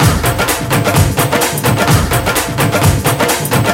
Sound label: Latin House Loop 128 BPM; Vengeance Samples